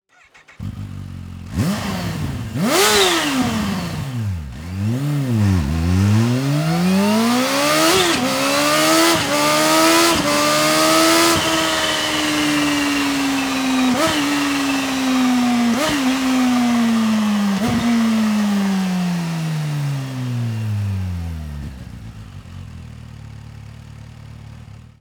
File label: Akrapovic Slip On Ninja H2 SX